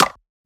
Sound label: Perc, Percussion; FLPRO